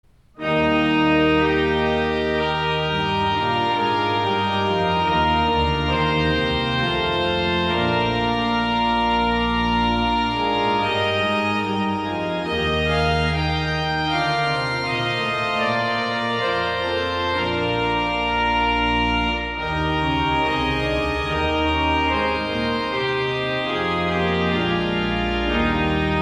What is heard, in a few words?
Organ; Johann Sebastian Bach; Wachet auf; St. John's Cathedral, Jacksonville Florida